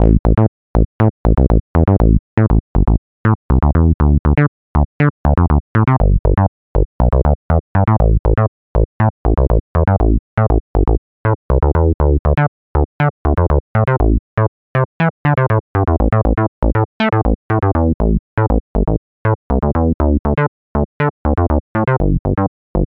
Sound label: state var filter